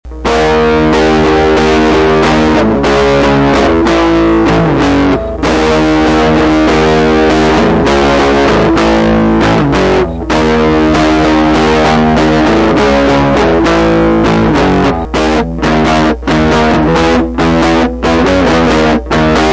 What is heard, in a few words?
harmonic